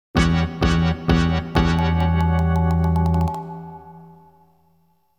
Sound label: Failure; Super Shinobi Works